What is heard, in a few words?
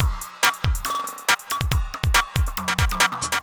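industrial 140 kit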